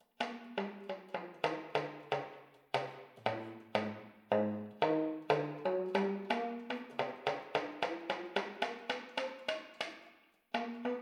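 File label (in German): ten, Slap, NormalerSlap; Secco Slap; Open Slap. Tenor; saxophon; spieltechniken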